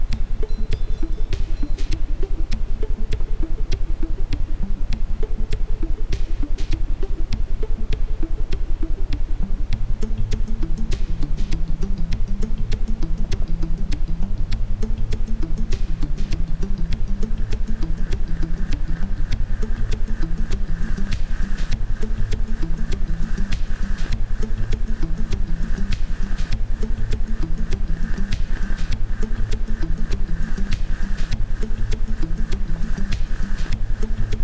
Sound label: isochronic, Ambient Sound; Gute Laune Stimmung; isochrone toene